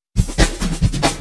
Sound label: Loops Fills; Vengeance Samples